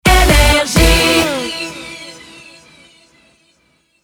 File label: Jingle Rapid Fire; NRJ